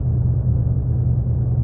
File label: METAL